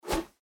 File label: card folder open